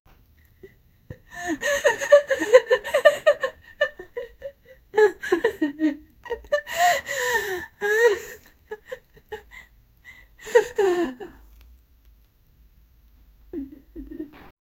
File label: an elderly woman crying; narrative